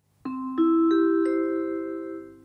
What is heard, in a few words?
gong; rozklad; zapowiedzi